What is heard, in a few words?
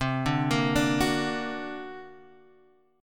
D Augmented 7th C, guitar